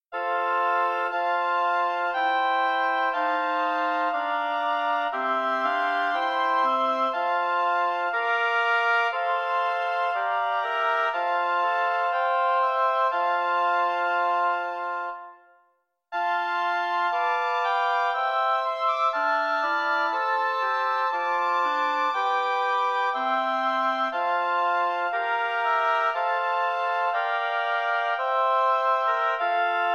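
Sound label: lyrical oboe quartet; no1 bach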